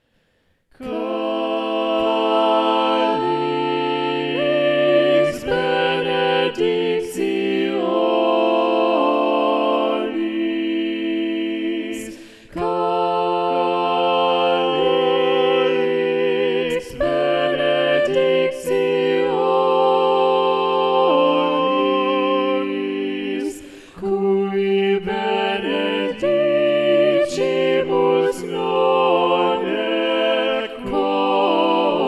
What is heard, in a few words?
SATB Balanced Voices; Calix benedictionis; Kevin Allen